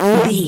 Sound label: fart squirt